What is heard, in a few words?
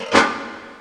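hi pedal; drums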